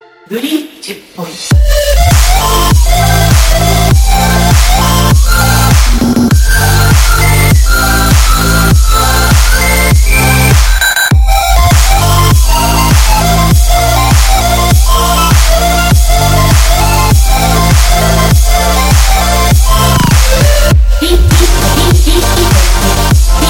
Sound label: glitch boy